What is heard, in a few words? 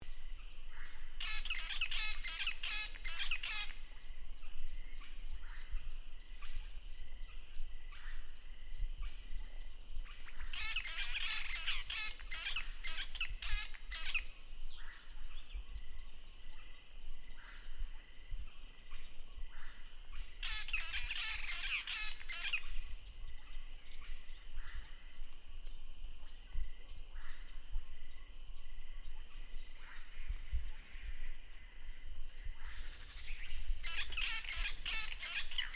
Velvet mantled drongo; پرنده بوجانگای مخمل پوش